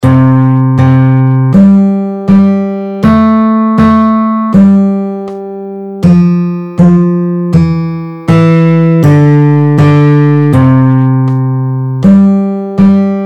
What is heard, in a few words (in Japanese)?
きらきら星 メロオンリー; きらきら星メロディーオンリー